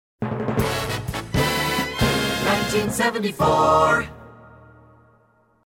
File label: Hits, Drops